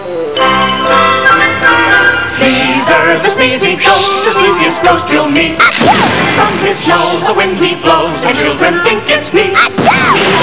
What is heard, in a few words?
sneezer